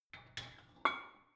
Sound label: barbell light plate put on hit soft; sport freizeit; fitnessstudio